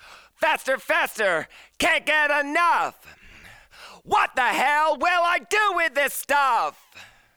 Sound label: Vocals